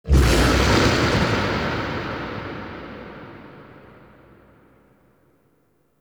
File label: Growl; Leverian; Voruna; Warframe Powers